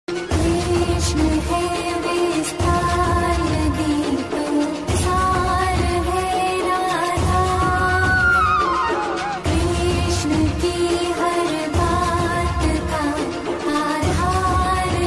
devotional ringtone

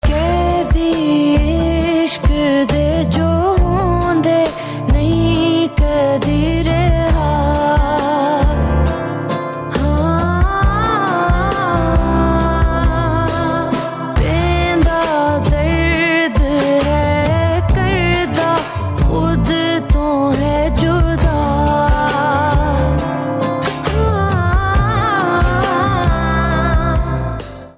pakistani ringtone

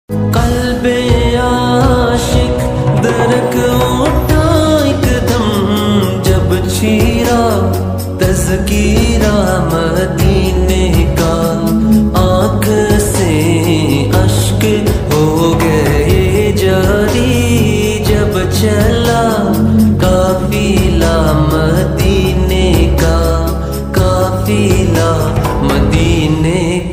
islamic ringtone

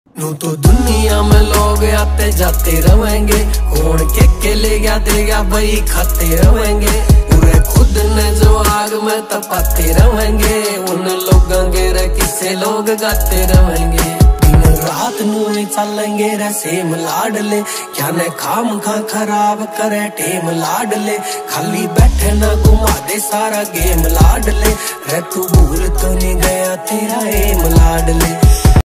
haryanvi ringtone

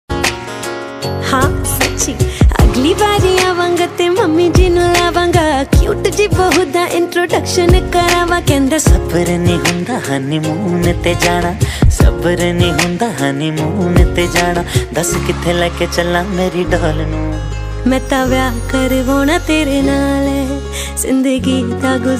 punjabi ringtone

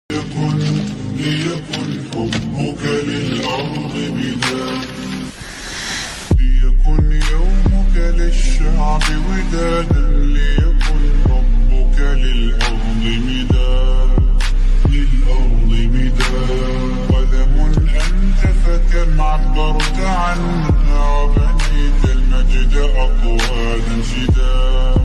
Islamic ringtone

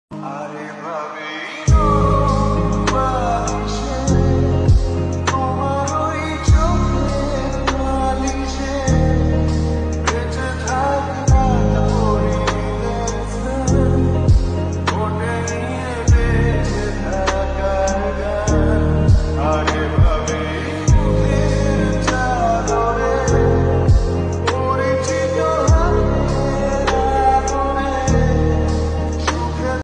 lofi bengali ringtone

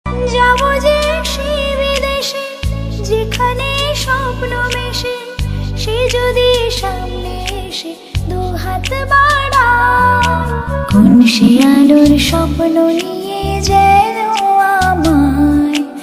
bengali ringtone

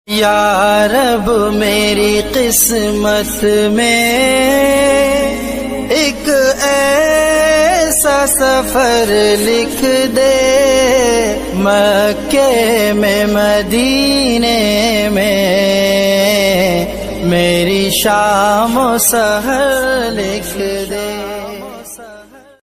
islamic ringtones

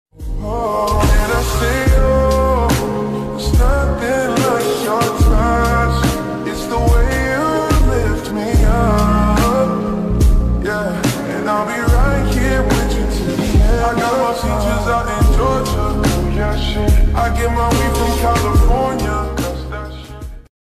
slowed reverb english ringtone

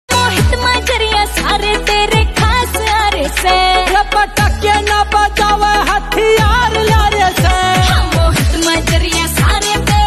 haryanvi ringtone

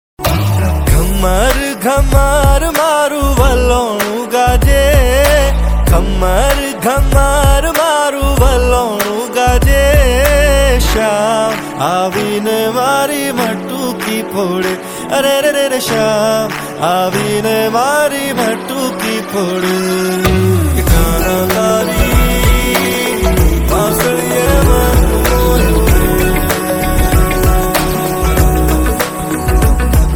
Krishna Bhajan gujarati ringtone

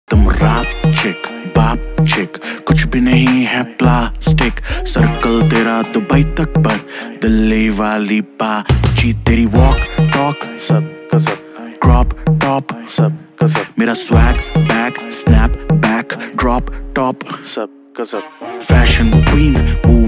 rap ringtone

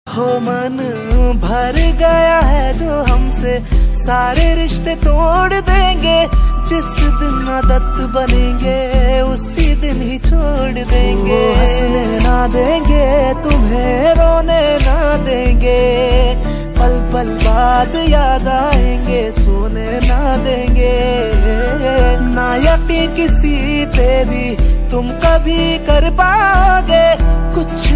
Male

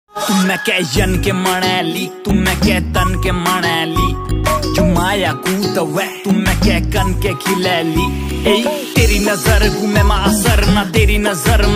uttrakhandi ringtone

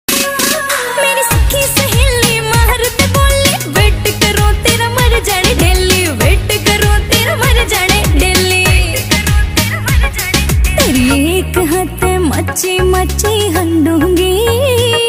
Romantic haryanvi ringtone

